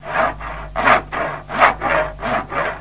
saege; winpolis

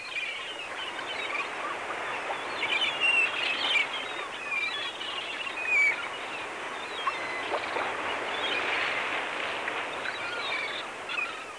Meer Loop; Loewe1

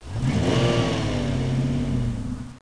Sportauto Sound

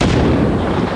bumm

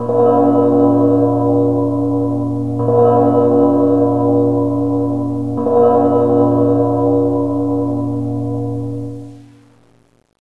glocken; Ultimedia; effekte

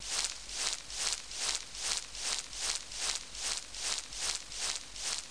Gras; Max

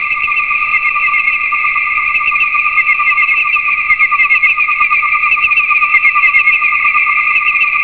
GRILLEN; da capo vol1; animals

